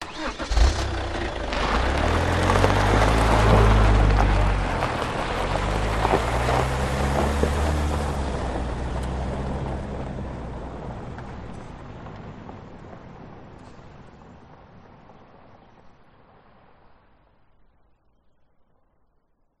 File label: transport car suv holden captiva start turn circle away gravel track